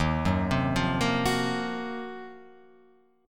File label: Eb Minor 6th Add 9th, Guitar Open F; Ebm6add9 arpeggiated; 3,1,0,0,1,1; JGuitar